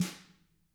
tama hit; ILIO; Double Platinum Drums 1

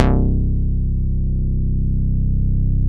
Synth Bass, Analog Bass

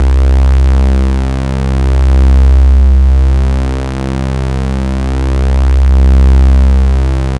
Synth, Raw Analog Multi's; Dream Zone

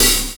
Adrenal HiHat; Sonic Foundry (Sony Creative Software); Drums & Drones; Construction Kits

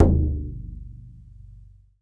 BODHRAN, Celtic Percussion

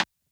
Rim Hard Wood; Lost Tapes From Mars; Clap & Rim

